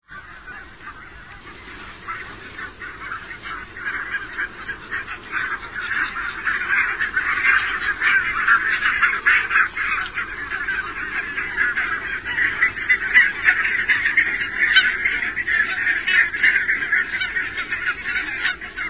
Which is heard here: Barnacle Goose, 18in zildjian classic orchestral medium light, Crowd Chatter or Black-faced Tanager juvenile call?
Barnacle Goose